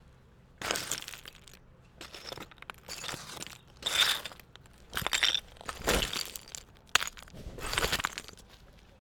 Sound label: Rubble